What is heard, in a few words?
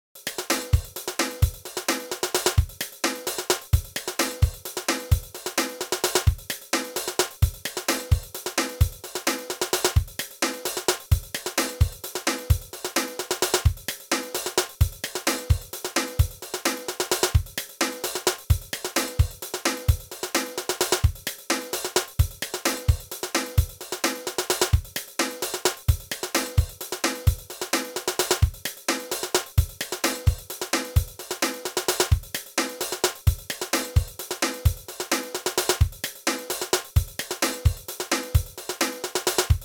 Trap; MDrummer